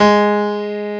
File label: PIANO AB2; St.Piano&Strings